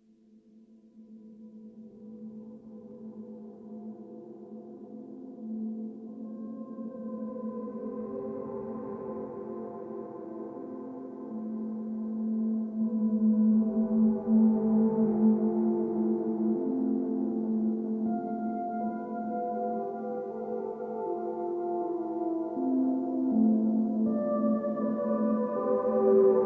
Synth Version

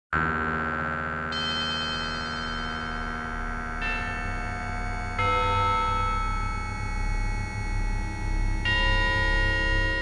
Electronic Music

